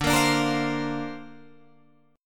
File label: D-Minor 7th Sharp 5th D Guitar DADGAD, chord